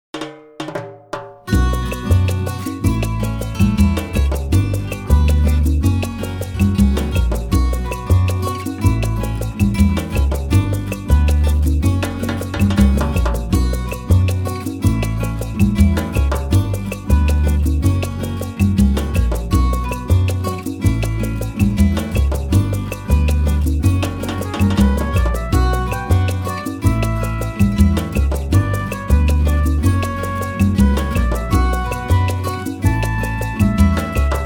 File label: Afro Cuban Cha-Cha; Trad